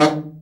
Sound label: BRA Bari, SAX; Best Service Gigapack 1; BR SAX 2